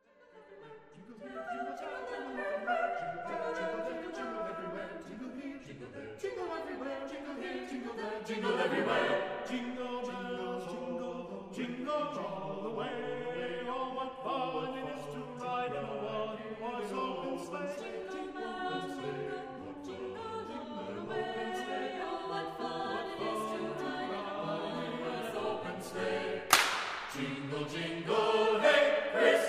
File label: Jingle Acappella